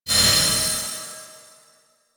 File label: Owl Recon Item Found; Duviri